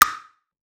Perc; Sample Banks; Percussion

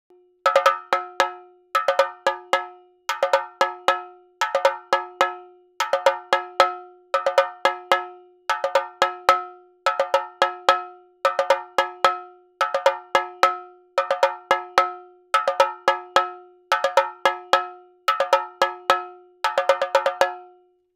CHENDA 110 5 8; Percussion; CHENDA TRACKS

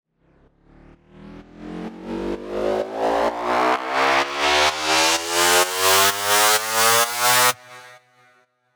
FX Uplifter; Sample Banks; Percussion

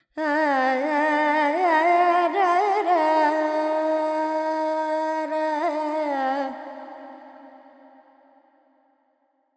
FX Lydian, Vocals, Carnatic Female, Alaps; Sample Banks

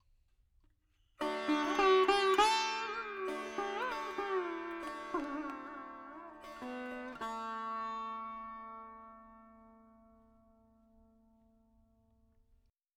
4 4 D 100 Dry, Melody Veena; Sample Banks

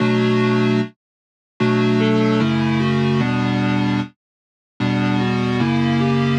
Keys Saws Bm 150; Sample Banks; Melody; Keyboards

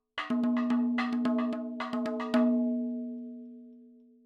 110 7 8 MADALAM FILLS, percussion

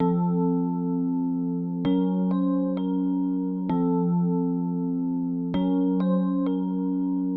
Rhodes Dark F 130; Sample Banks; Melody; Keyboards

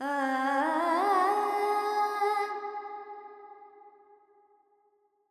Verb Charukesi, Carnatic Female Alaps

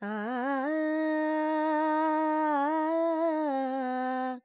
Charukesi, Vocals, Carnatic Female, Alaps, G, Dry

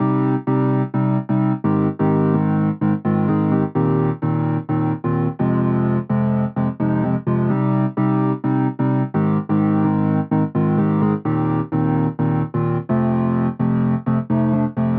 Keys EDM Chords Cm 128; Sample Banks; Melody; Keyboards

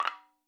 madalam notes R

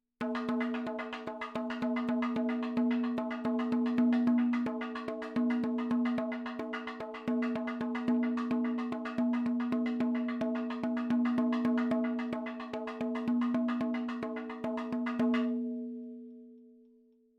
110 7 8 MADALAM, percussion; Sample Banks; MADHALAM; MADALAM 7 8 FILES

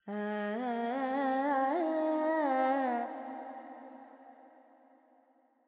Pentatonic, Vocals, Carnatic Female, Alaps, G

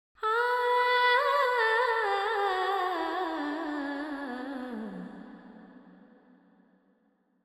FX 4-4 G 100, Vocals Female

